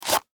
Gun Holster Remove, Gun, Holster, Remove, Handgun, Pistol; Warfare; Pistols; BlastWave